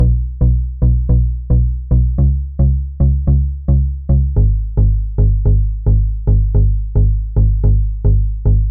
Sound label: 110 BASS B; Azura – Organic House Sample Pack